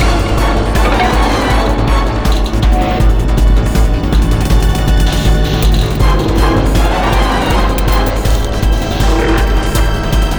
Steelworks Fast; rive wreck hack die retry; ps4

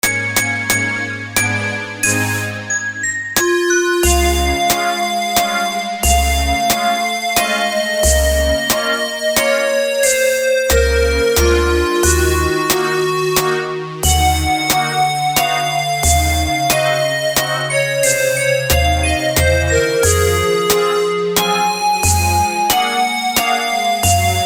A Waltz; paper mario the thousand year door; GameCube

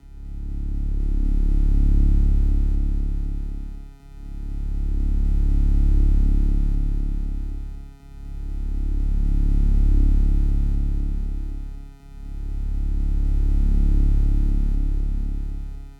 title drop ambiance

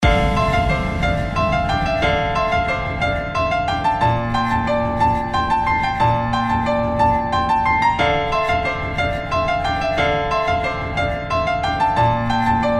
Raid Boss; Mist Train Girls

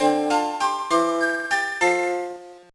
Unused Jingle; Barbie as the Princess and the Pauper

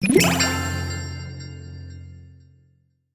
Cannon; Hook

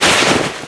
banner

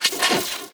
Alien deploy; Blastmorph Pinball; xbox series xs

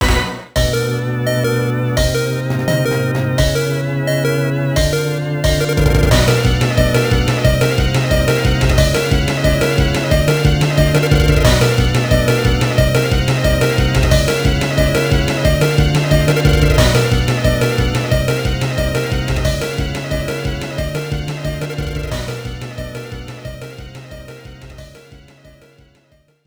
Battle pinch - Low Hp

